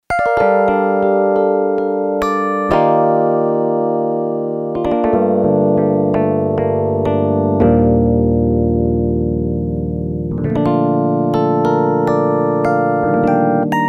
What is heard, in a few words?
Electric Piano, Yamaha TX802; Performances; Factory Patches